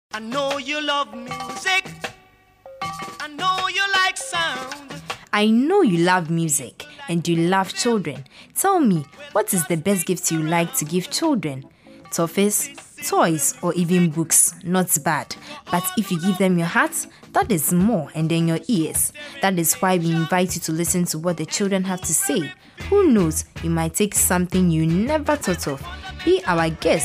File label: Jingle; Uniiq Curious Minds